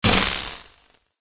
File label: electricity; smite; chaac